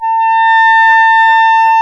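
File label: wind; Roland - LA Composer vol. 3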